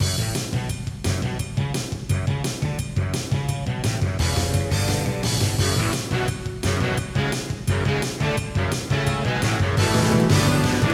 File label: Intro, Casio CTK-800; Duke Nukem 3D